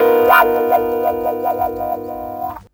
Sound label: gtr, guitar